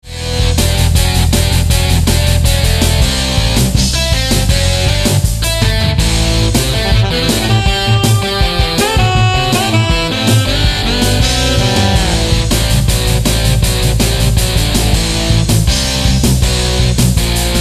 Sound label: sax